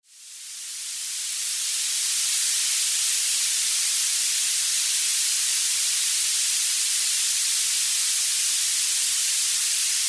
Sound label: Violet Noise